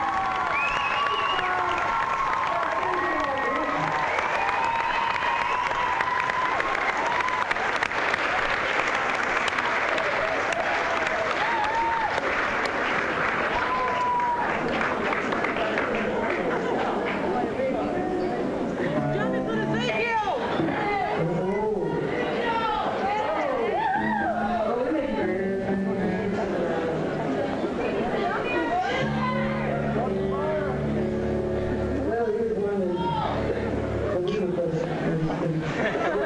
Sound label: crowd; adelaide, australia